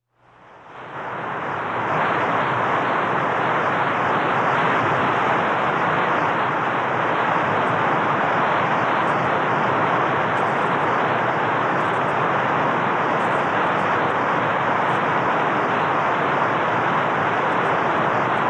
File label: Rapids In Mountain Stream